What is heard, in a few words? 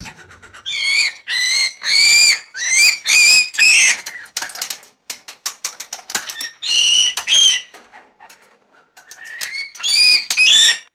agitated cockatiels screeching and cage rattling; birds